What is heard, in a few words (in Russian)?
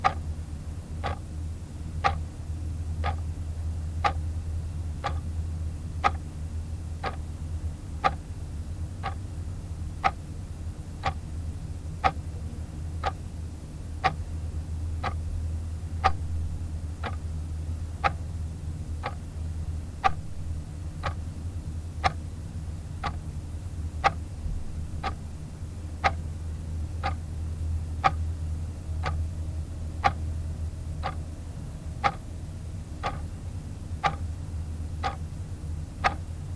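тиканье аналоговых часов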